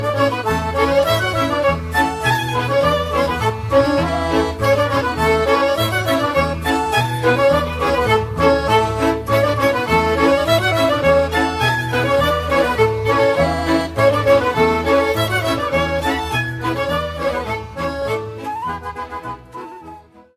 POLKA - Danemark

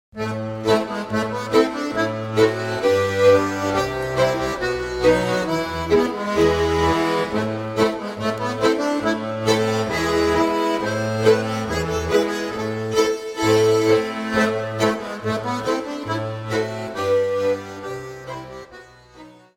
Scottishes Finlandaises, Finlande

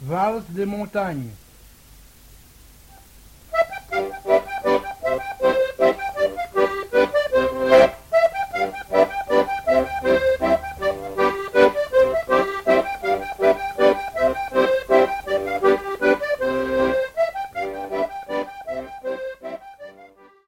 Valse des Montagnes, Belgique

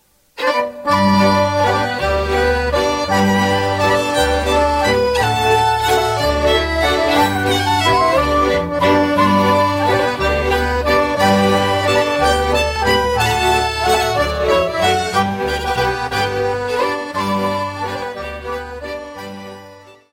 Danemark; UNGKARLEVALSEN

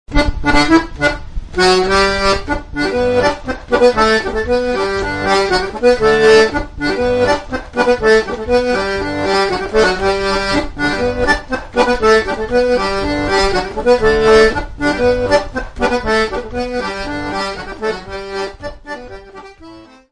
Polka - France

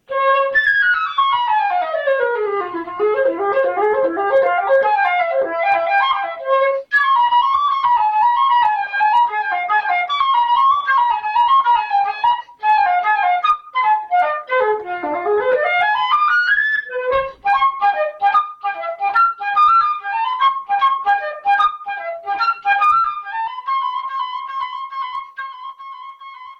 flute traversiere; instruments

